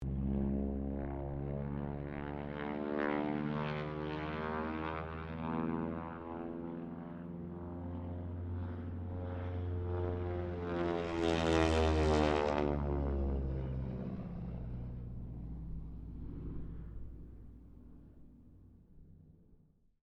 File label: Harvard squadron 4 pass, stereo; Mixed aircraft squadrons; Airplanes; Sound Effects:Vehicles